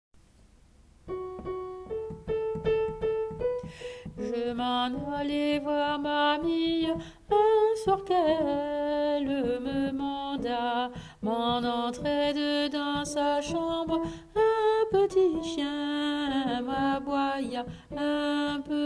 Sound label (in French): basse; petit chien